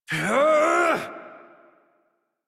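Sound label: Exertion, Adult, English; Warframe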